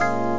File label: Little organ